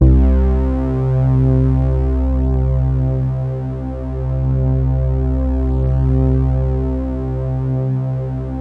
C2 analog plug; Sound Gallery - Charged

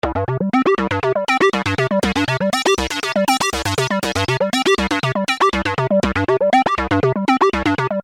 Arpeggio 120 C, Techno Synth Loops; Sonic Foundry (Sony Creative Software); Signals I

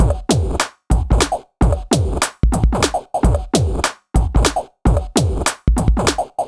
BEAT, Reason Synths; neuro; Sinistarr

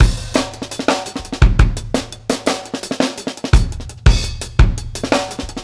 Bon Bon Kit 4 Bar, Drum n Bass Beats; Beats and Percussion; KJ Sawka; Drum n Bass & Beyond

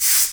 noise fx; Masterbits; Switch FX

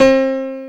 PIANO MID

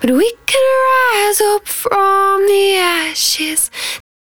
110bpm Ab, Velvet Vocal; Zero-G

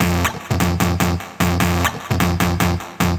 150BPM Guitar Fx F; Antidote Advent

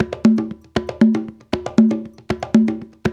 African Tap; Spectrasonics; Supreme Beats

